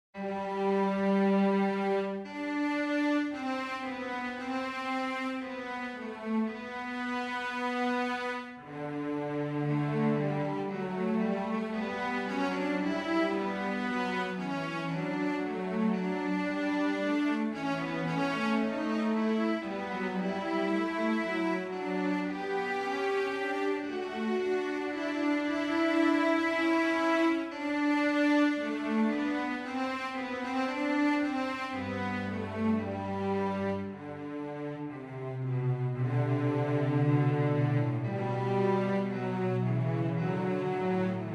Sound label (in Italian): Fuga Sol; Harmonie Beethoven; Esempio 20